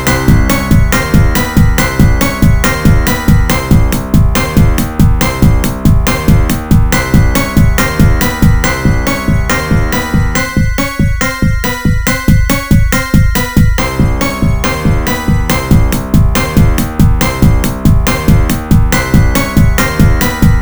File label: escaping